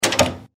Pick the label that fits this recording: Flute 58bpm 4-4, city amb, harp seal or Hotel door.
Hotel door